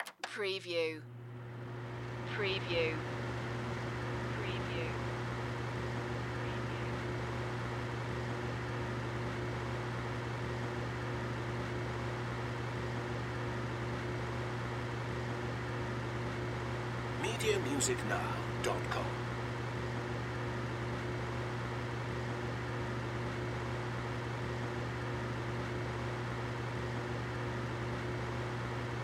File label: Humidifier On Run Off; sound-effects-bible